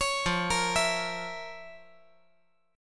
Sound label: F Major 7th Suspended 4th Sharp 5th F